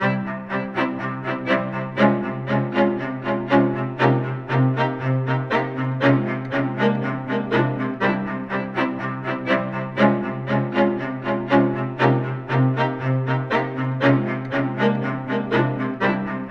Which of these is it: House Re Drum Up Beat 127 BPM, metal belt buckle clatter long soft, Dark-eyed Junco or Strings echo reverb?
Strings echo reverb